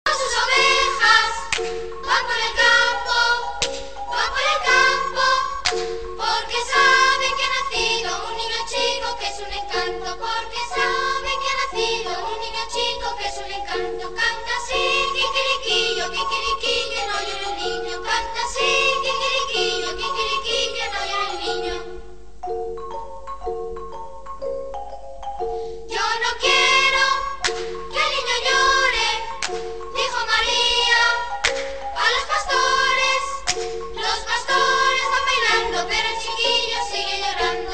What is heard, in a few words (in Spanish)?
Villancico Gallo